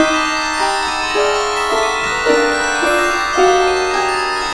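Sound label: Ambient Music Loop; Ghostbusters The Video Game; NDS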